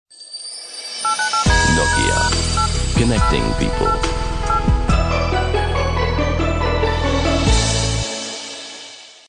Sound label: nokia 3d message ton ringtone